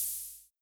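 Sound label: Hi-Hat Open, Luxor Passet; Drums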